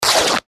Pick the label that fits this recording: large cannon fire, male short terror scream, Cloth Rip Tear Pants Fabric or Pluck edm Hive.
Cloth Rip Tear Pants Fabric